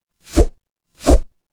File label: transition swoosh